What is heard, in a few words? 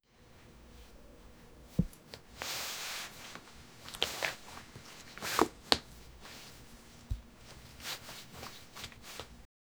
girl putting on and zippi, clothing